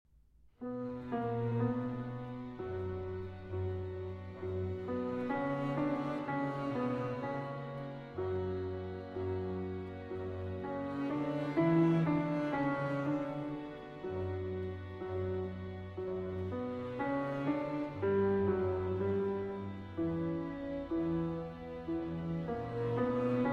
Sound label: Keyboard Concerto in D Major, Adagio e Piano Sempre; BWV 1054 II.